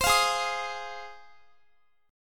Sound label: Ab 6th Ab, Guitar; x,x,22,22,20,22; Ab6 strummed